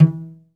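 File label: SOLO CELLO, Synclavier Strings; ILIO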